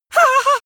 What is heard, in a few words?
male afraid squeal; vocals; comedy-cartoon; soundpool